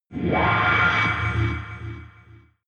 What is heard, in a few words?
alien metallic scream dark reverb; aliens